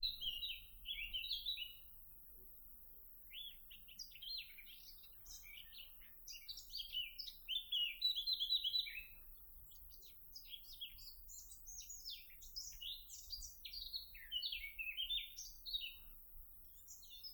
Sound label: birds unknown sound